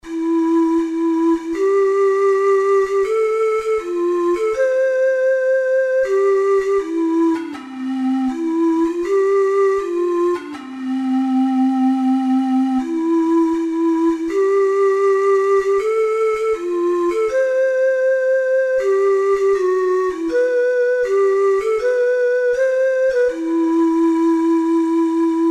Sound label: o holy night, ocarina four hole